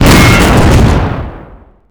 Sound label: tiger engine destroy; WAR